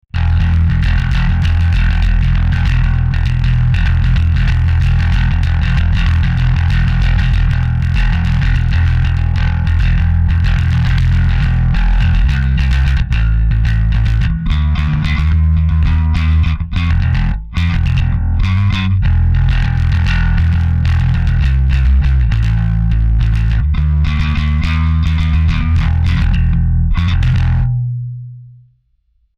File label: bass